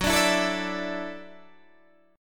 F sharp Minor 7th Sharp 5th F sharp, Guitar DADGAD